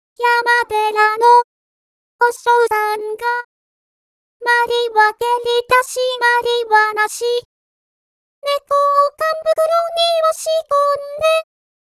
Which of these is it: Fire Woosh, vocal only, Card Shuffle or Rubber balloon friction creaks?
vocal only